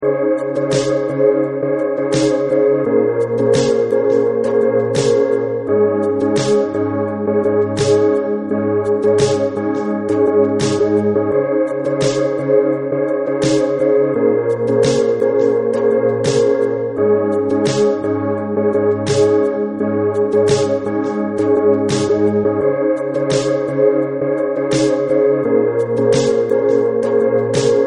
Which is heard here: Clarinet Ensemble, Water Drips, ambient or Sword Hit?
ambient